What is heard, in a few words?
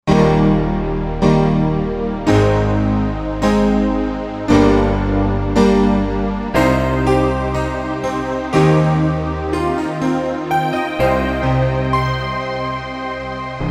Korg Wavestation Piano Layers